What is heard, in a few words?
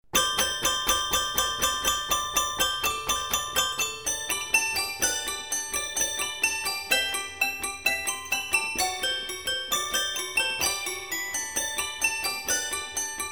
jingle